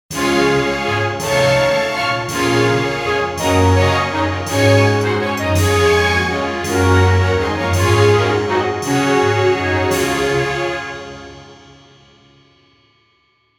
BGM Classical

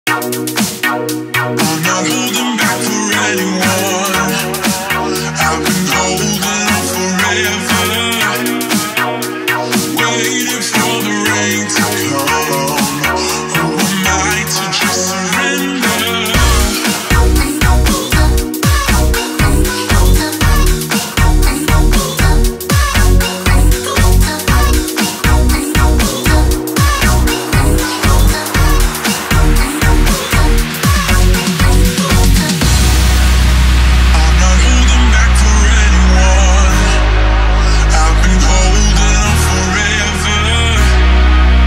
Glitch Hop 110BPM

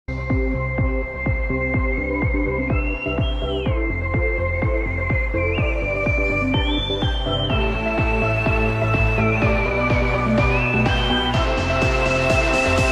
AHHHHHHHHH, Meme Sound Effect

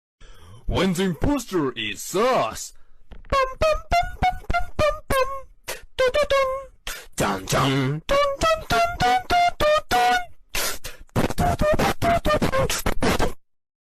SUS, Meme Sound Effect; Among Us

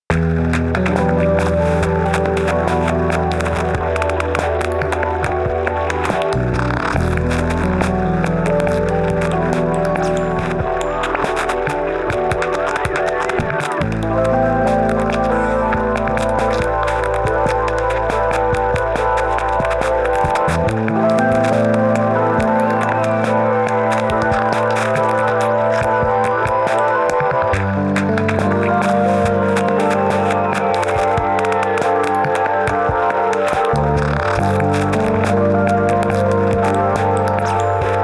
Static Sleep Loop, intradiegetic music

